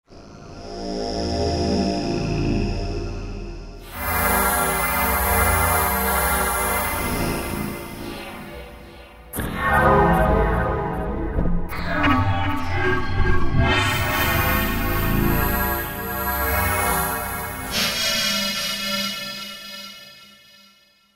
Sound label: Ring Boom, Roland JD-990; Factory Patches